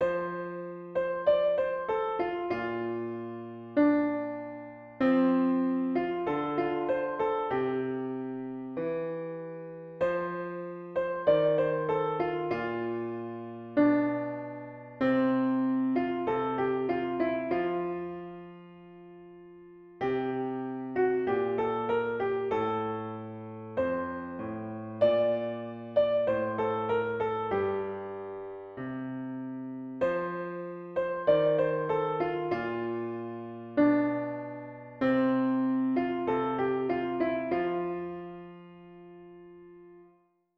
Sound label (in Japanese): ピアノ